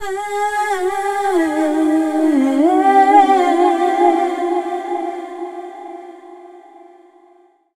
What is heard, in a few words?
Dance Vocals, FEMALE